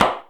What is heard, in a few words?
Big Paper Bag